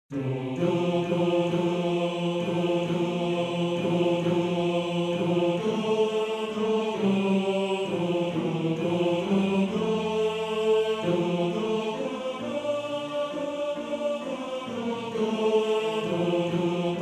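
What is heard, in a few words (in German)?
Tenor 2, Männerchor; Heimetvogel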